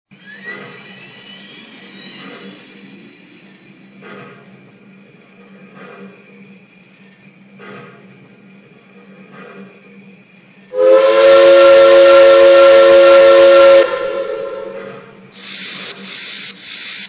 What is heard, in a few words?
Pacific